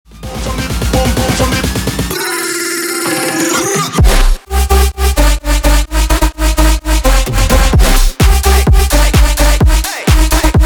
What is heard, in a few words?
tv noise bass